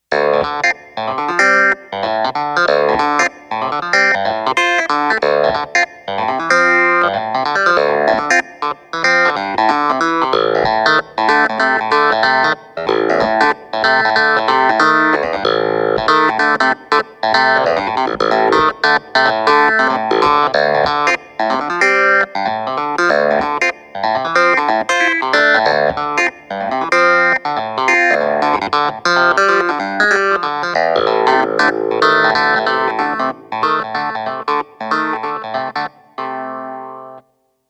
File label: phase clav